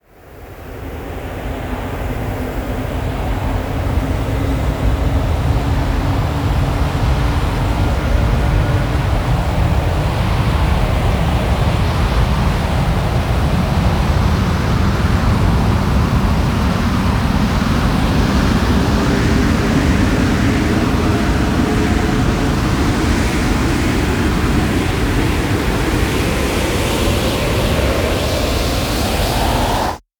riser upwind

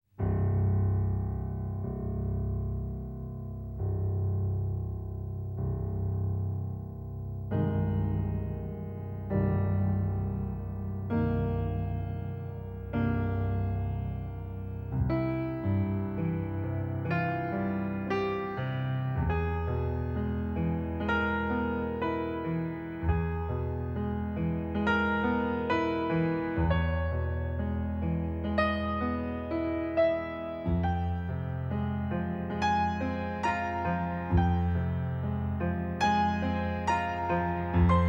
piano

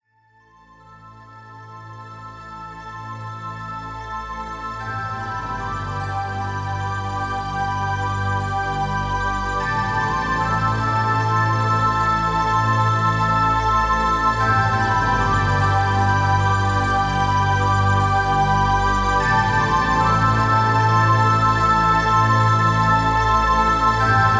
winter forest synth version

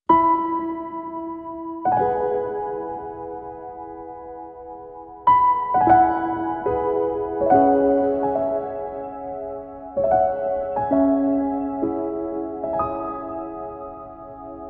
piano, birdsong

